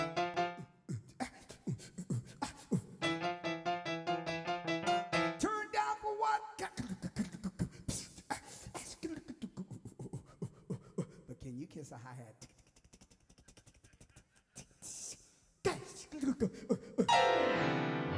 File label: Stereo Mix; NAMM 2018; MyMix Recordings NAMM 2018